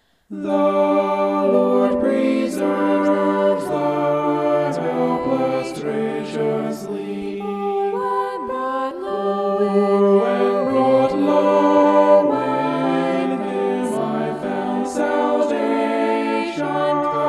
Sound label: vocal poly; psalm 116